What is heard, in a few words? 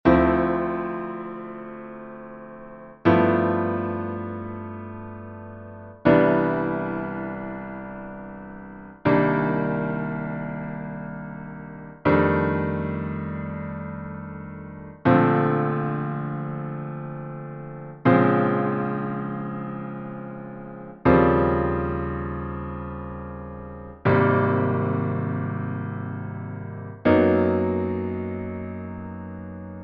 II-V-I's Descending Steps